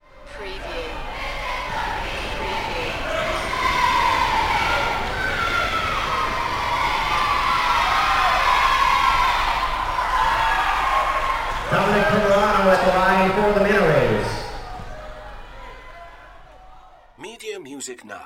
Basketball Cheerleaders Chanting with Announcer Speaking